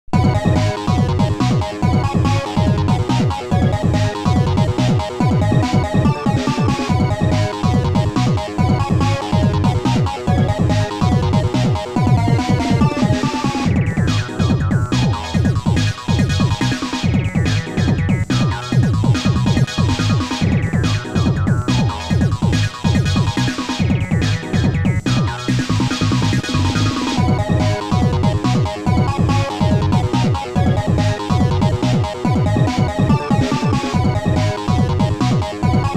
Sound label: Race Win; F1 World Grand Prix II; N64 gamerip 1999